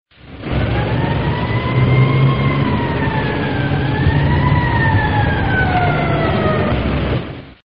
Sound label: Police Siren; Police Sirennn